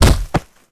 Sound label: Rats; Rabbids Multiverse